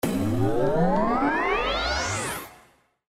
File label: Bonus Anticipation; slots; Robot Vs Monster